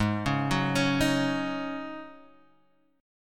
Ab 7th Suspended 4th Ab down Guitar Open F, Chord; Ab7sus4 arpeggiated

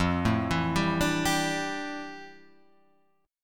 F Major 13th Guitar Half Step Down

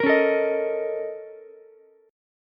Bb Minor Major 11th Bb, Ukulele; BbmM11; strummed; 15,13,17,18; JGuitar

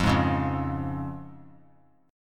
F Minor Sharp 5th, chord; Fm#5 strummed; JGuitar

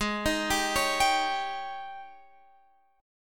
G sharp Major 7th Suspended 4th, Guitar, Open F; arpeggiated; x,15,15,14,16,14; G#M7sus4

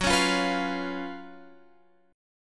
Gb Major 7th Suspended 4th Gb Guitar Open F

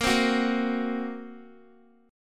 Bb Suspended 2nd Sharp 5th, Guitar; Bbsus2#5; strummed; x,13,12,11,10,x; JGuitar

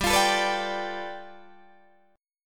G 9th, Guitar Open D

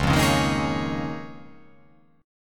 C sharp 6th Add 9th C sharp down

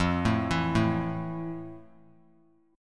F Major 7th F; arpeggiated; JGuitar

